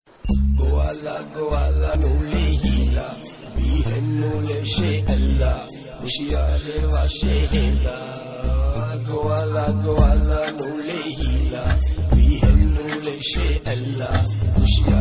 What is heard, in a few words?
dhivehi ringtone